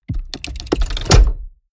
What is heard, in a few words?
mechanics large lock rattle; industrie-wirtschaft; mechanik